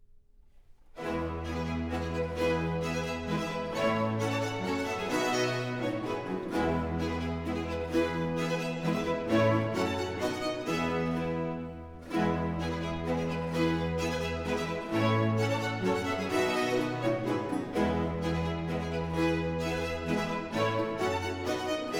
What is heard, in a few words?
Hornpipe; Baroque; Purcell; Highlights Opern